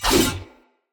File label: Dendrit Dagger Whoosh, Swords; Weapons